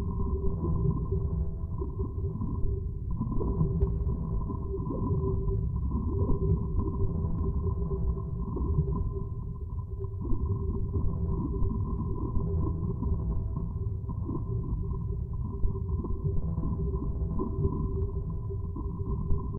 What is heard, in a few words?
water pipe; ambience; AssaultCube